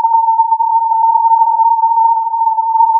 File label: filtered input